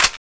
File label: clip out, mac10